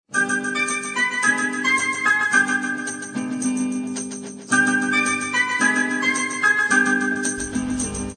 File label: sms ringtone